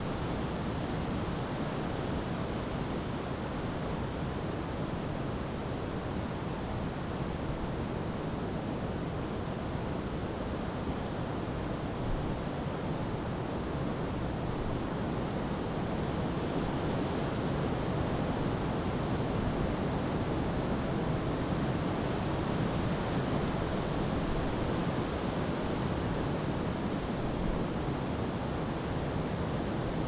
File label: wind medium